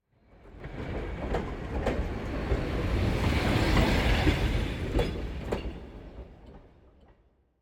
Train Short Low Speed Passing European Train Type - L to R; Hollywood Edge; sound designer's toolkit v2